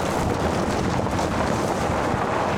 Auto Tires Dirt Loop; Automobiles

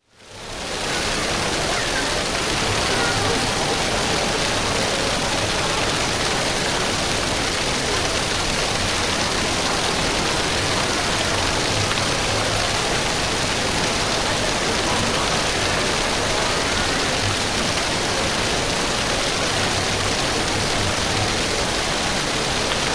Shopping Mall Large, Indoor Fountain Running; Crowd; Sound Ideas 2000 Series

